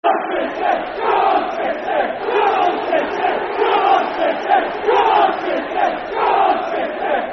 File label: Come On City [Football Chant (Soccer Song)]; Bradford City Bcfc